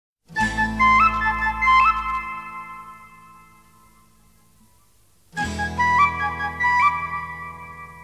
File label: Cute Msg